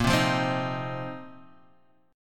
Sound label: A sharp Suspended 2nd Suspended 4th A sharp; strummed; A#sus2sus4; JGuitar